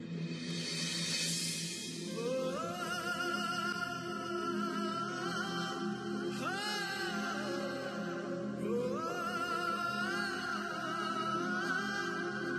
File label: music; Begusarai